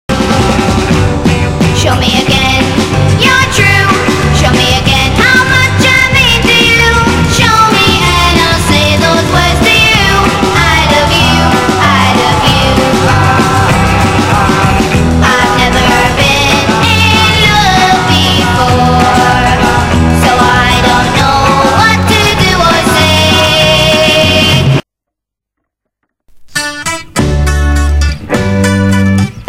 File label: chimes